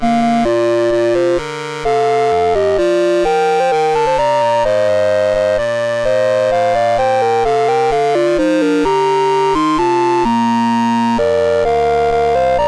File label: Round, MOS6581; MOS6581R3